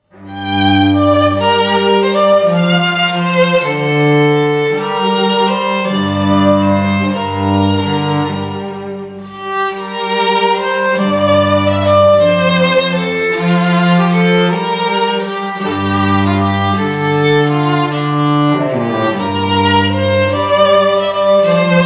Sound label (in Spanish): Greensleeves audio tradicional celta